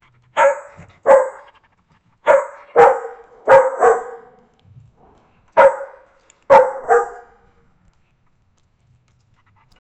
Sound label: dog growling then suddenl